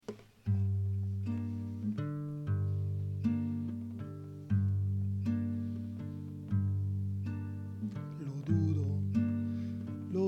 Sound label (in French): Accords Chant